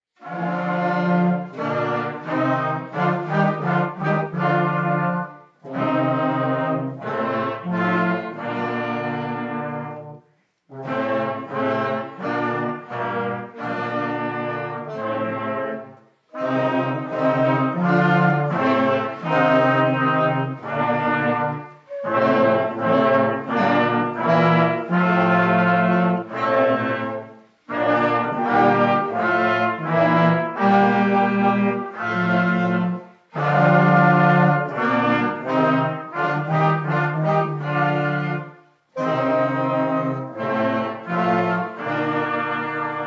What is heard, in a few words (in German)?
Blaeser Kling Gloeckchen